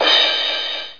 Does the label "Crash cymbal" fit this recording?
Yes